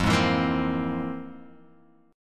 F Minor Major 11th, Guitar, Open G

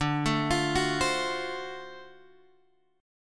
D 7th Sharp 9th D, chord; D7#9 Chord {10 X 7 10 7 8} arpeggiated

